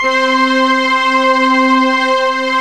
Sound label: MLT TRK JX3P